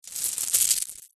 gemstones jewels handful pick up; foley

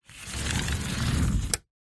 household sliding window slide close

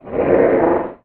science fiction alien creature growl; sound effects one

